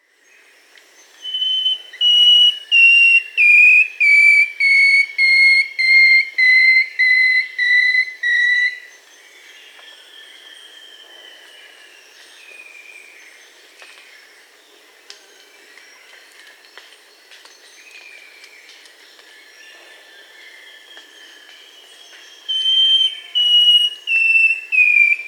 Long-tailed Woodcreeper (Deconychura longicauda), Peru; S. America